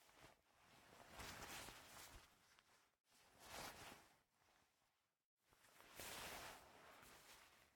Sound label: Player Awake; MiSide